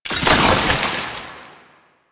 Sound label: Alchemy Grenade Pickup Cold; Warframe; Entrati